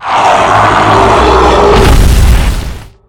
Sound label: Ice Giant death